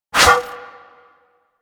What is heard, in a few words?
Disarm Dart Shot; Warframe; Warframes; Pacifist